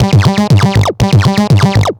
Analoop, Industrial

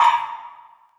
Metals Oneshot; Sonic Foundry (Sony Creative Software); Esoterik Beatz

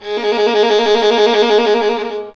STR VLN, Solo Instruments; Miroslav Vitous

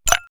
Ice Cube, perc fx; X-Static Goldmine

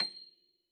Honky Tonk piano C6; Classical Prepared Pianos; Total Piano; Honky Tonk mp

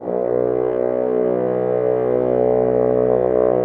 French Horns

